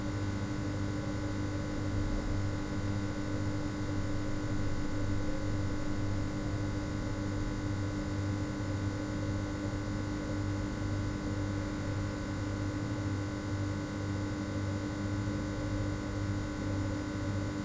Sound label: Neon Lamps Ambience; blood waves